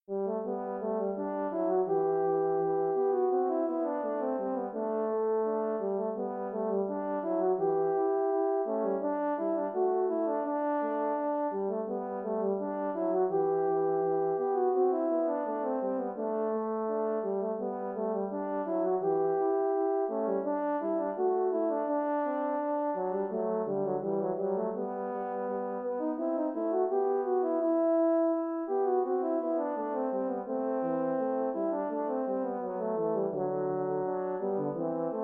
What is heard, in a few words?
gavotte in g major 2horns; handel